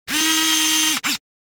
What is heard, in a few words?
Camera movement lens focus zoom